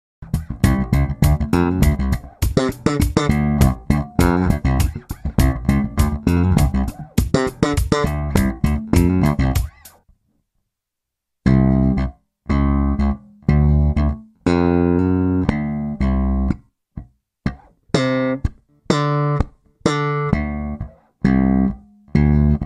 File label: Double thumping in D, Doublethumbing slap bass in D